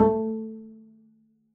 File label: pizz a3, double bass; dsmolken